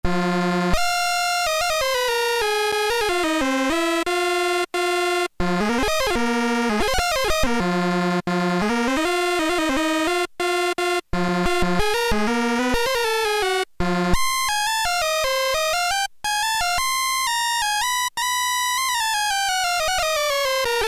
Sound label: Alternate Lead Synthesizer, Realistic MG-1 Concertmate; audio examples from the Patch Diagrams